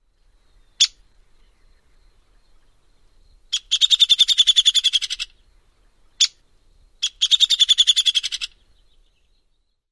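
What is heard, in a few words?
peek and sputter calls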